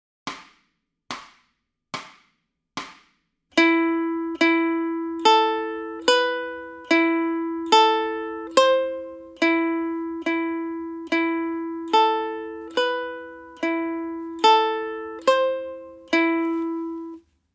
Ton E Warm-up, ukulele

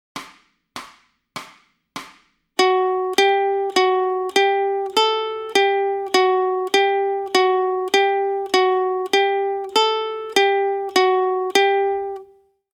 Fis Warm-up, ukulele; Ton Fis

